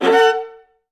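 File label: violas F; Best Service; Peter Siedlaczek's Advanced Orchestra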